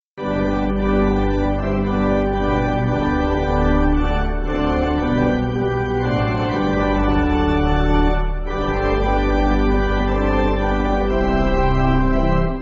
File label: Pipe, church music